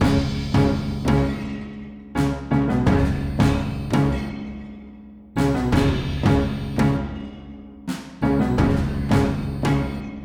Town Music Loop

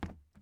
plastic step

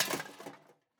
metal break; teardown; ps5 windows xbox series xs

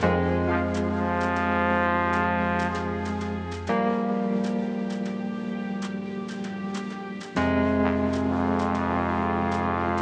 Loop 130bpm; Mozy; jenny leclue detectiv

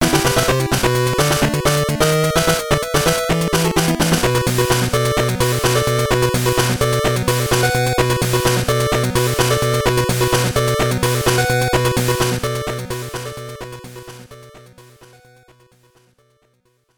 Stage Clear; Power Rangers Time Force; GBC

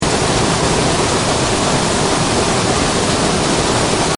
Rushing Water; HiberWorld; Android

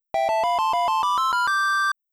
Begin Playing Shooting Game; Wii Room; Theater Update

